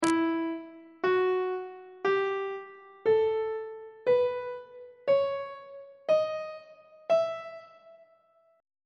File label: d double sharp melodic minor scale note positions; on treble clef